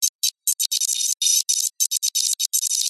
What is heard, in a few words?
science fiction data read out print screen